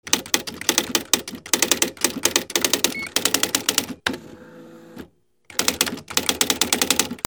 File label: OFFICE